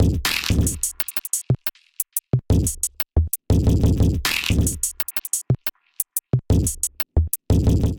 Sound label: Snake Paw Kit, Drums; Ableton; Factory Packs; Glitch and Wash